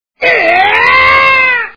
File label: eugh